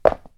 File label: Laminate jump